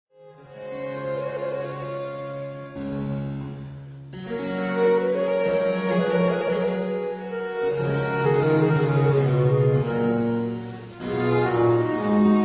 for 2 violins & continuo; Les Nations; Sarabande

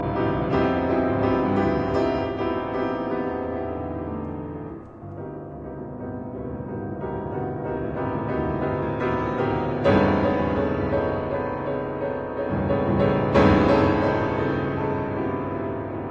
Prelude in B major; 6 Preludes and Fugues; Prelude No 6

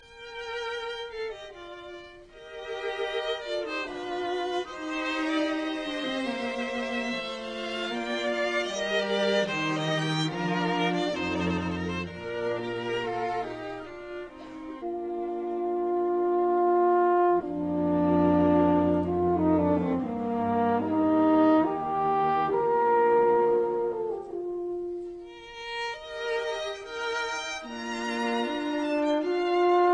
Horn Quintet in E flat major, Andante; Mozart; K 407

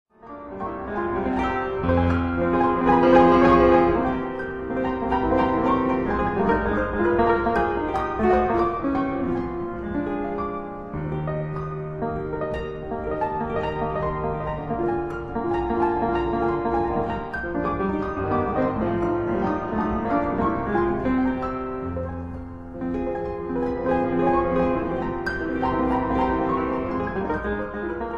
Etudes for piano in Eb major

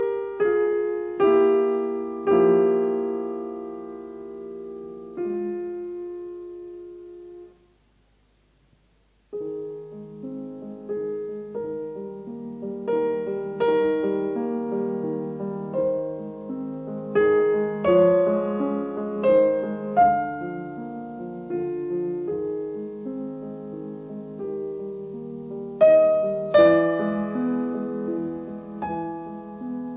Piano Sonata No 2 in F major K 280 II Adagio; Lili Kraus

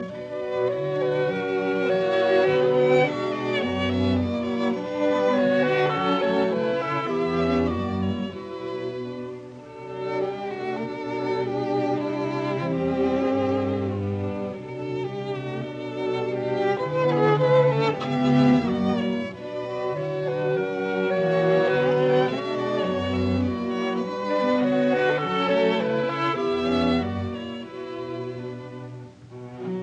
Clarinet Quintet Con moto; Brahms; in B minor; Op 115

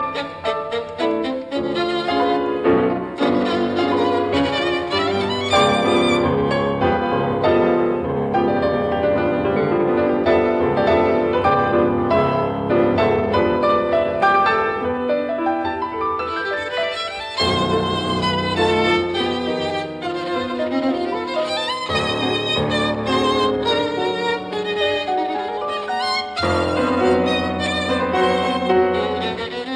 Violin Sonata, Moderato; Bloch; Louis Kaufman

